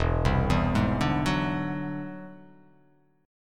E Augmented 9th E down; E+9 arpeggiated; JGuitar

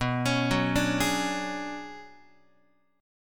B Minor 6th Add 9th B, Guitar; Bm6add9 arpeggiated; JGuitar

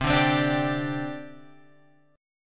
C Suspended 2nd, C, down, Guitar, Open F; Csus2; strummed

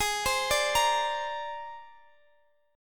C 7th Suspended 2nd Sharp 5th, Ab

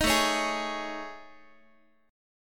A Major 7th Flat 5th, down Guitar; AM7b5; strummed; 18,17,16,18,x,x

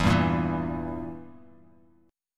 E Diminished E, Bass Standard; Edim strummed; JGuitar

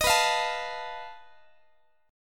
B 7th Flat 5th B Guitar; B7b5; strummed

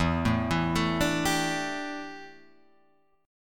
D Suspended 2nd Suspended 4th E

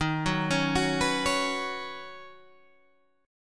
D sharp Minor 7th Sharp 5th

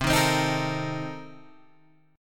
C Minor 13th C Guitar Open G

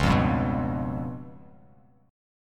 Db 7th Flat 5th down Bass Standard; Db7b5 strummed; JGuitar

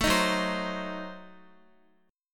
Gb Diminished 7th, down, Guitar; strummed; JGuitar

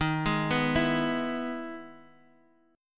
E Major 7th D sharp, chord

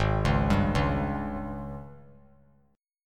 G sharp Major 7th Suspended 4th G sharp; G#M7sus4 arpeggiated; JGuitar

